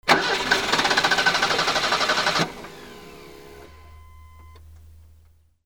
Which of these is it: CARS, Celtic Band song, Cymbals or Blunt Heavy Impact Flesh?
CARS